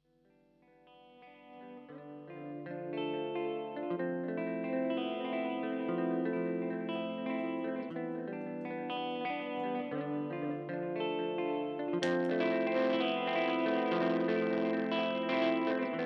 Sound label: Delay; death by audio total sonic annihilation